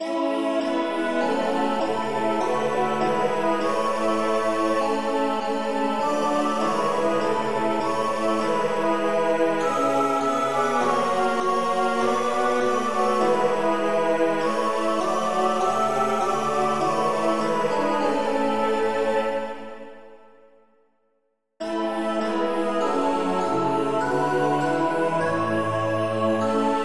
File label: hymns